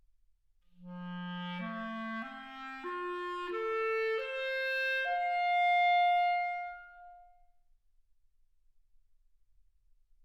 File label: clarinet